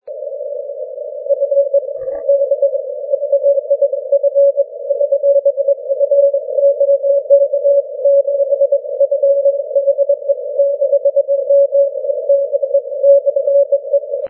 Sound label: 6m cw